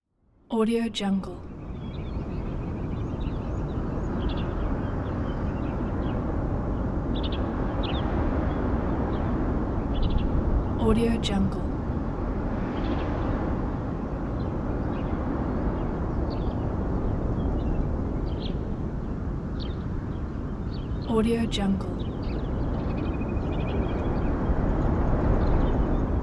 mountain wind

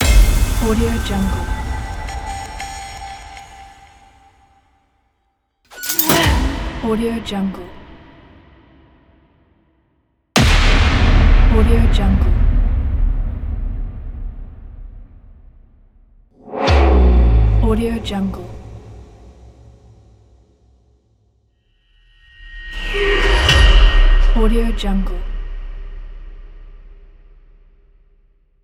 power

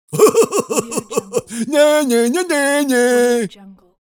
cartoon laughing teasing bear

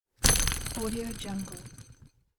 roller spin

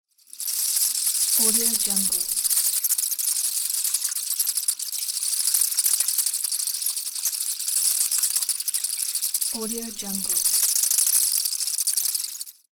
bats

